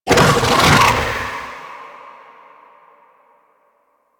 Devour Cast; Warframe; Mummy

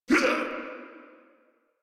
Pain, Grineer Male; Warframe

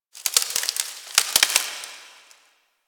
Foliage Rustle; Ambience; Warframe; The New War; Quests; Zariman

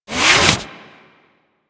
Arsenal Working To Sleep; Warframe; Liset; Hub; Machines; Tenno Arsenal

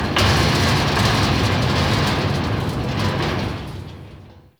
Object Rattle; Warframe; Infested

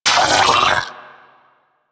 Arachnoid Vocal Pain; Warframe; Corpus; Arachnoid Hunger

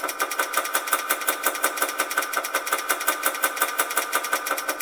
Moon Clock Machine Small Loop; Warframe; Ambience; Orokin

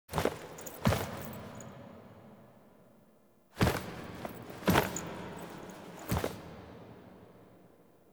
Foley C; Warframe; Quests; The New War; Sealab; Hunhows Lair; Drifter

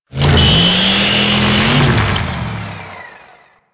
Saw Ride Start; Weapons; Melee; Warframe; Grn Ghoul Saw Player

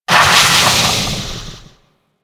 Generic Death, Vocals; Warframe; Orokin

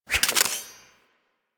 Throwing Dagger Reload; Warframe; Weapons

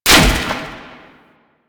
Orb Destroy; Warframe; Duviri; Conservation

